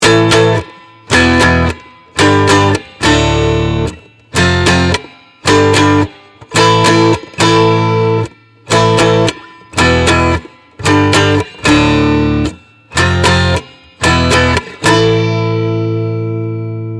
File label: Tailpiece Amp